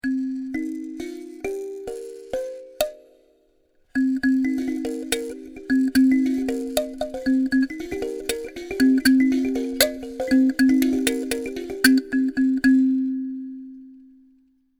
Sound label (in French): son Sansa; Percu; Sansa 2; Duperrex